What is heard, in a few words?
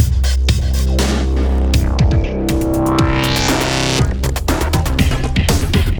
Taunt; Evos